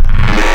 Mechanical, wavetables; Cymatics; Outbreak Wavetables; Serum

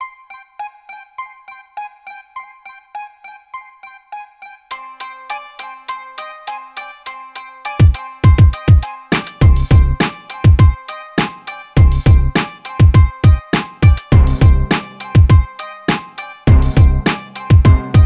102 Cmin, Hip Hop Exotica

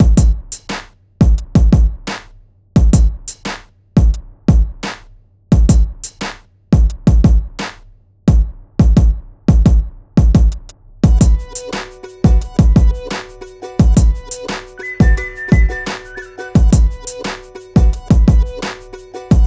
087 Bmin, Hip Hop Exotica

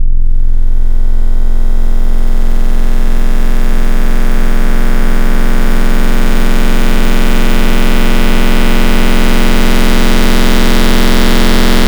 Noisiest Saw, Future House Wavetables; Cymatics; Serum

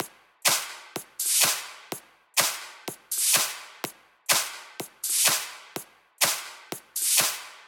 Clap loop, Big EDM Drum Loops 125 BPM; Future House; Future House Tools

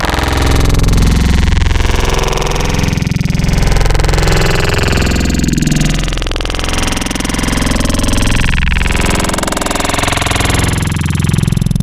Growl Tables; Transforma; Cymatics; Growl Tables Vol 3; Serum

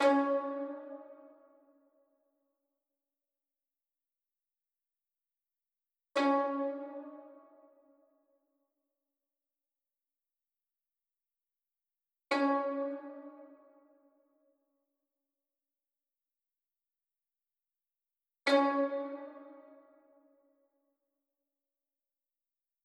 156 BPM G# Min Guzheng; Cymatics; Quest; Destiny Gold Expansion

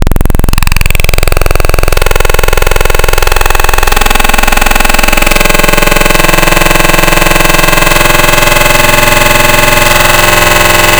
Detune, FM Wavetables; Cymatics; FM Wavetables Vol 3; Serum

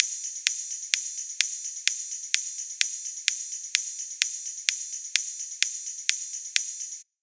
Shaker loop, Big EDM Drum Loops 128 BPM; Future House; Future House Tools

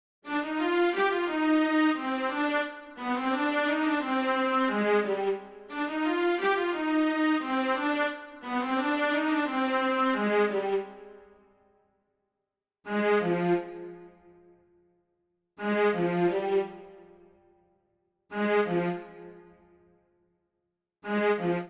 Strings

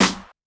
Snare B Hit, Bmin; Hip Hop; Hip Hop Exotica; Apple Loops; Big Fish Audio

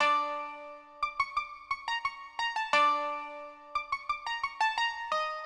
Baglamas, Hip Hop Exotica, 088 Dmin; Apple Loops; Big Fish Audio

